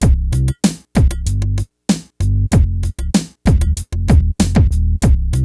BEAT, Beats 93-96bpm; PlatinumPhattCD1; E-MU Producer Series Vol. 8 – Platinum Phatt

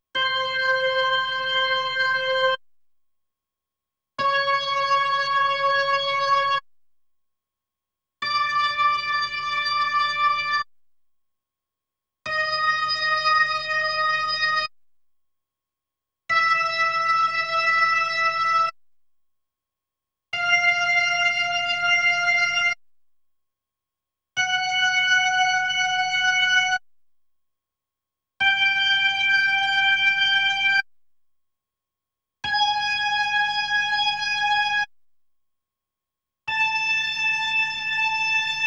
B3 Organ; Masterbits CLIMAX 8

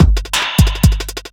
Speed loop Kick and Hats, Drums Beats

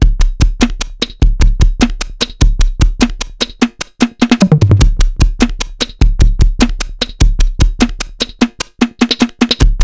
TECHNO, Funky Xtreams; INIS

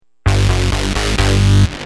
Heavy, Bass; Industrial

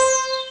ahh vox; Amiga Soundtracker Sample Packs

